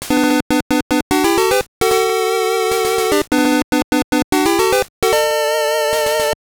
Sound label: Jingle; power mission 1990 gb